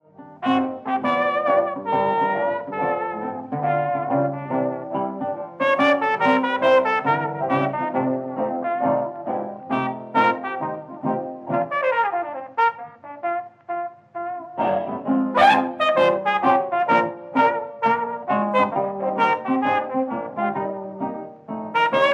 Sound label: Solo